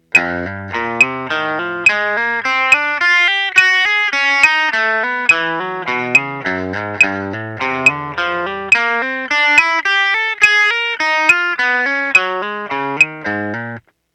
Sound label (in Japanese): ハンマリング練習